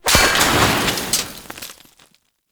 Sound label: Fire Extinguisher Destroy